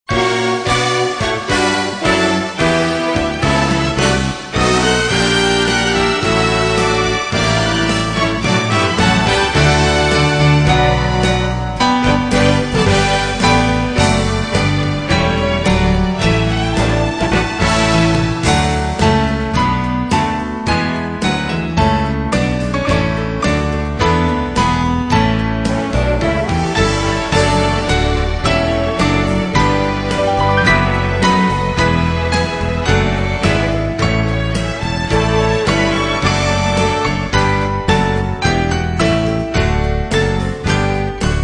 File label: Instrumental Hymns